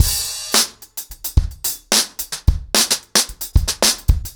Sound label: 110BPM, acoustic drums, drumloops